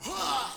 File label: huh male, Voice; ooh ahh; heh huh